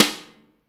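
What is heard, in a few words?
snare drum, 180 BPM; Ueberschall; Drum & Bass Resonance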